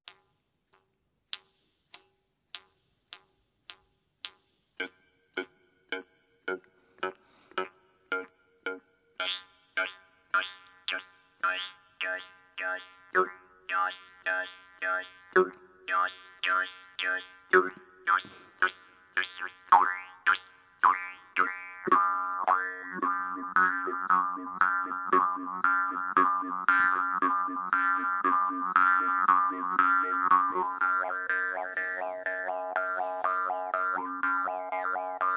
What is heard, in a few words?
Ambiance; Tran Quang Hai; Dan Muong